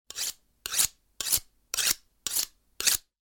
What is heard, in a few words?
sharpening knife